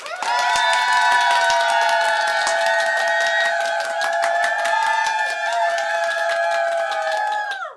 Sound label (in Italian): applauso, applausi, persone